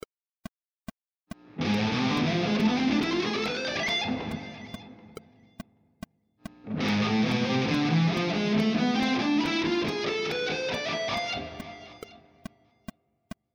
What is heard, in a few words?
phrygian dominant